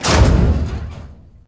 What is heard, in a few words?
crate clunk; doors elevators; Star Wars Obi-Wan; XBOX